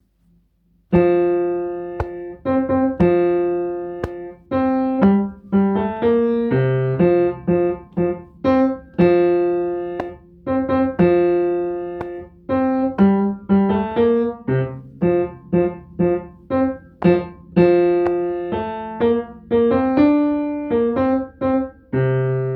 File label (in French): Basse entier piano; Simi jadech